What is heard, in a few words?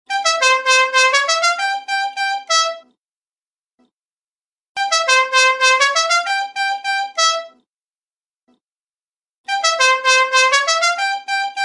Dixie Horn